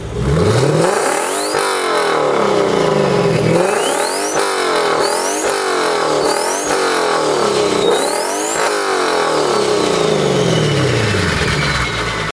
Lexus Twin Turbo

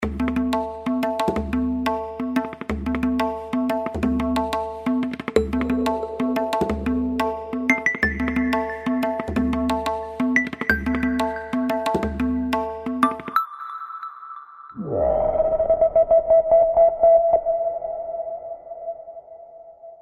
Tone Mix

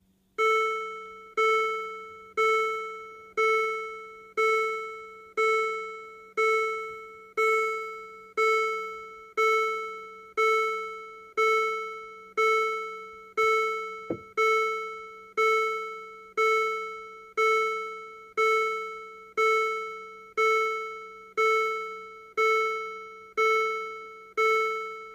Mercedes Door Chime

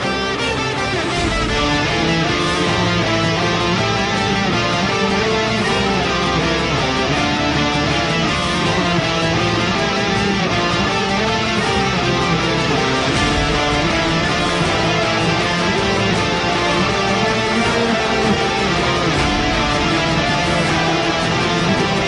Epic Guitar

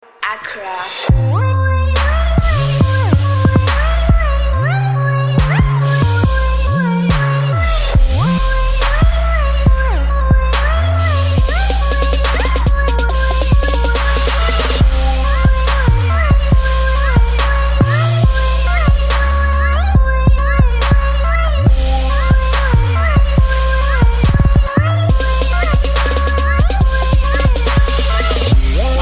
bass

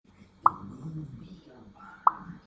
Double Pop

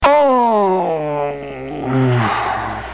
Male Elf Yawn

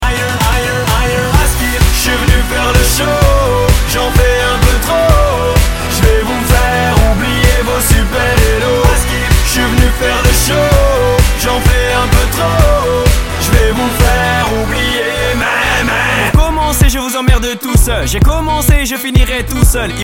soprano

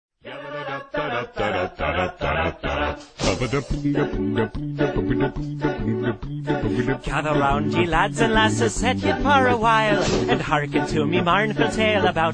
Irish drinking song

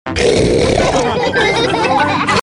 pig grunt; children

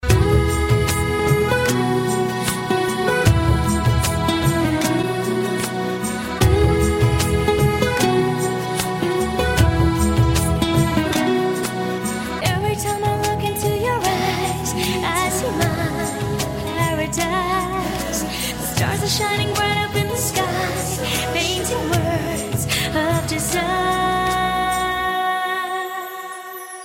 instrumental, ringtone; holiday

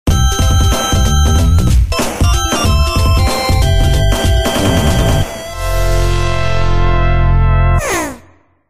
Game Over; Ristar

